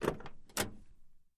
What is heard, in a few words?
Camaro Door Open Thic, chevy camaro